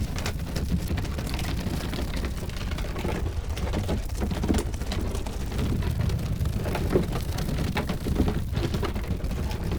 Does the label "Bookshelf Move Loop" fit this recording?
Yes